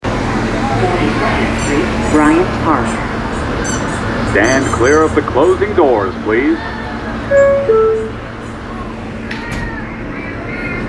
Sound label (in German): NY Ubahn